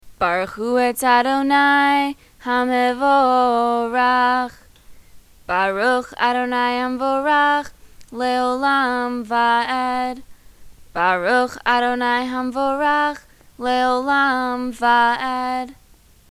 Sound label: barchu, friday night ma'ariv